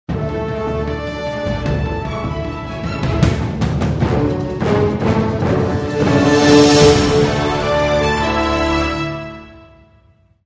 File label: Show Close